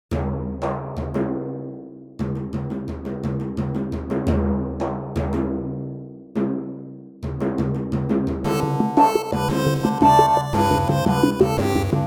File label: Forest; FTJ Project